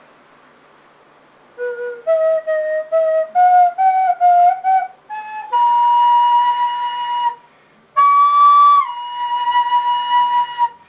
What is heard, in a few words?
quena